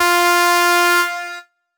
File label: Lead - F; 99Sounds; Monosounds; Music Loops; Instrument Oneshots; Leads